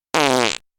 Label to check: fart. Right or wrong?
Right